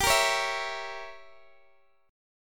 G Diminished 7th, Guitar, DADGAD; down

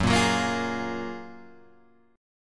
Gb Major 9th, down, Guitar, Open G

